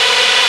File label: Aud Wall B3, Xtreme Lead; Multisamples; Digital Sound Factory Xtreme Lead